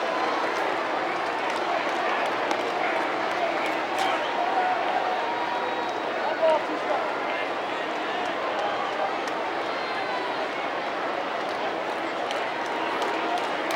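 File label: baseball crowd ambience loop; Crowds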